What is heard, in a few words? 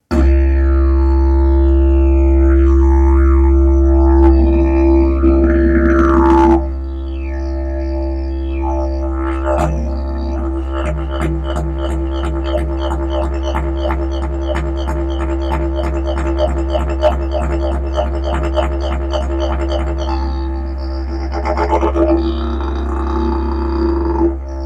didge